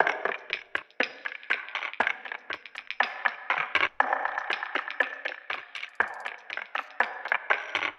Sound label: wood loop